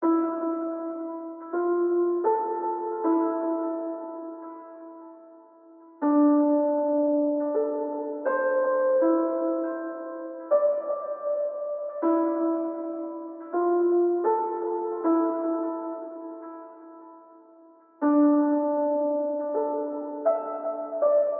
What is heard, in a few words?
Isolated Piano 160bpm E min